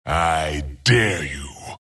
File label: death long; dota; skeleton king